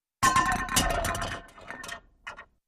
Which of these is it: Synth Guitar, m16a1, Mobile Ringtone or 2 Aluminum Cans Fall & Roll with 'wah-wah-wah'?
2 Aluminum Cans Fall & Roll with 'wah-wah-wah'